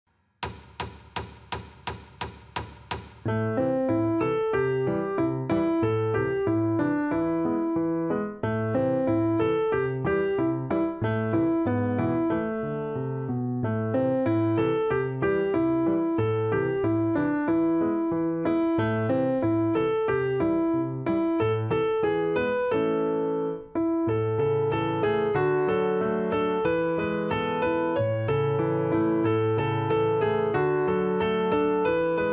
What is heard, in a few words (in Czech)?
metronom 170